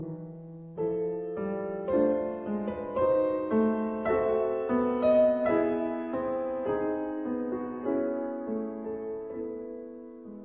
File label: Preludes in E minor Adagio; Op 103